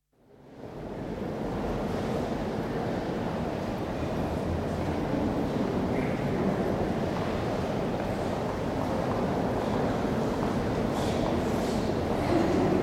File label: Interior atmosphere in nave of Notre Dame; Church Sound Effects; Churches; BBC